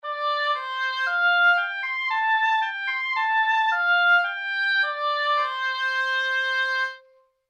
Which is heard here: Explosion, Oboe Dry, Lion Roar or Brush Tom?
Oboe Dry